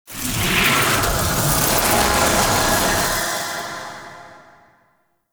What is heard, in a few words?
Upgrade; Kuva Lich; Abilities